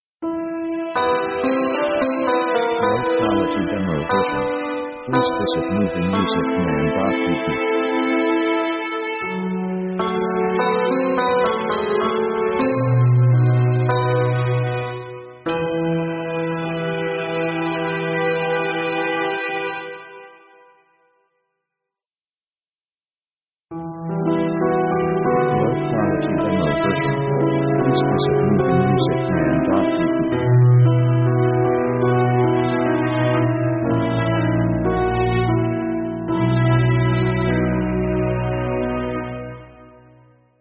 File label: ethnic zigeuner thema